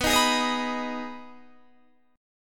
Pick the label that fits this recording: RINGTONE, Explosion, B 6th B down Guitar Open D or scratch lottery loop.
B 6th B down Guitar Open D